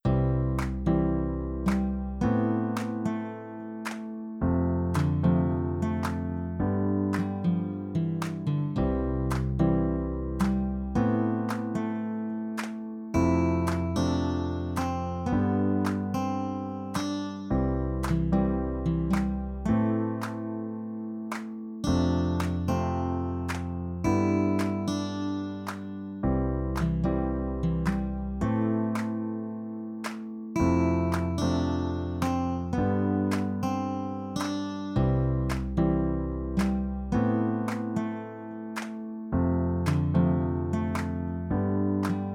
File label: Peak Music; Peak wind and music; blueberryPeak; Wwise